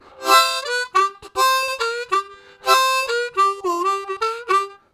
Staggering, Harmonica, Riffs; Zero G

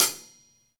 Rock Closed Hi Hat, Roland BK-5

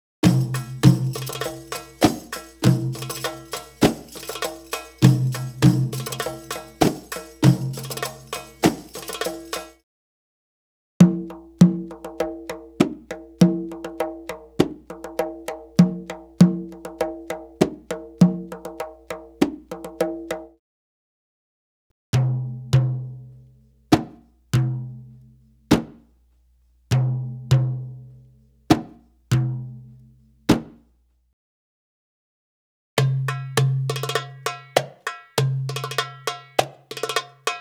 Arabic Rhythms

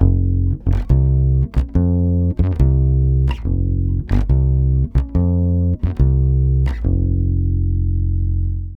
140 BASS F7, Brazil Bossa; USB Soundscan; AKAI

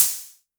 Hi Hats Open; LIMAT Div Dept

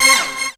HIT, STABS, House

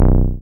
TB C2; Best Service Gigapack 1; SYNTB303

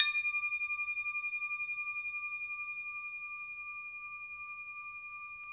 Crotales, Orchestral Perc

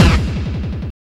hard bass drumz

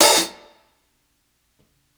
60s OPEN HH, HI HATS; Basement drums vol 2

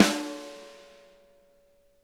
RIM, snare; Sonic Reality; Interactive Drum Kits

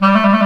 THE CLARINET

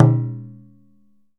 DJUN DJUN, African Perc

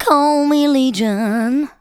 VOCALS SUNG; Techno Trance Essentials

